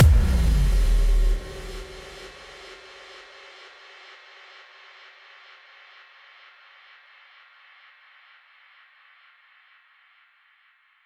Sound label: FX Boom